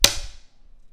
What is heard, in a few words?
Ruler Smack